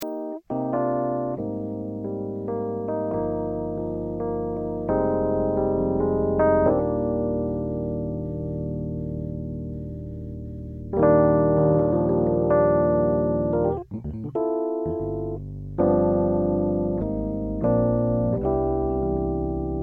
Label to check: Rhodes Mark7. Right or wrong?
Right